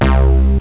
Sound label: synth bass short; OctaMED SoundStudio V1; jazzies samples